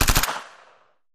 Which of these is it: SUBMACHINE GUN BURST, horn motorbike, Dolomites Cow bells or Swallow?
SUBMACHINE GUN BURST